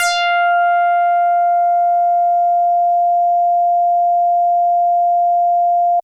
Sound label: Synthe Bass DX100 F6